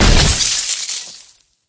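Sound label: Cast Frozen; Endless World Idle RPG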